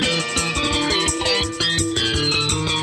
surf loop

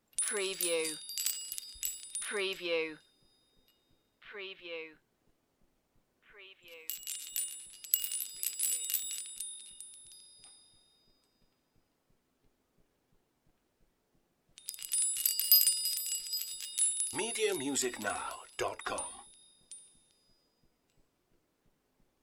Windchimes various tinkles

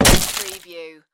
Door Kick Break Wood

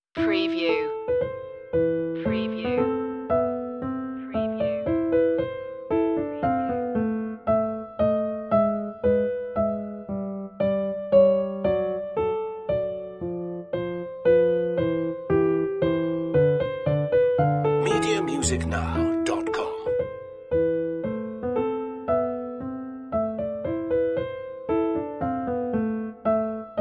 bach menuet a minor; notebooks annamagdalena bach; bwv anh120

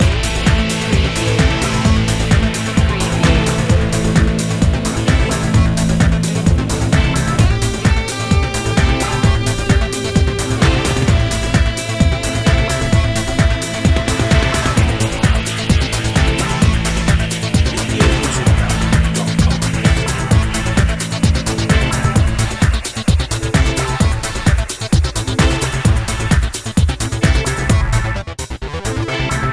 thrillseeker loop

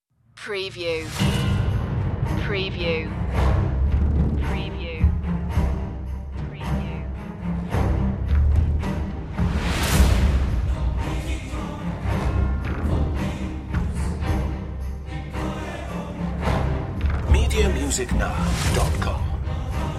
no rock drums

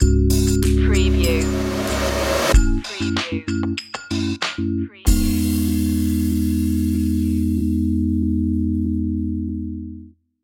funky and soul stinger

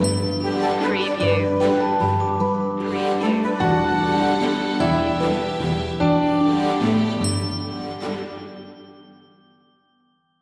regal waltz stinger

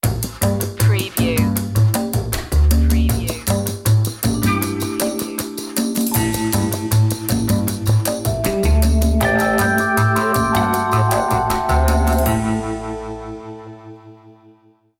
jazzy opener

